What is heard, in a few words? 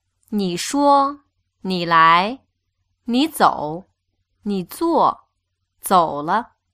tones